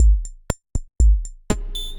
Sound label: ARP 2600 Drums; Ableton; DM ARP 2600 Drums by Flatpack